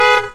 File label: horn honk